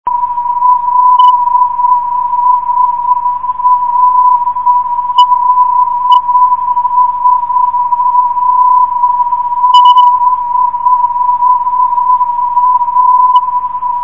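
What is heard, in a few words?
lsa 358khz, non-directional beacon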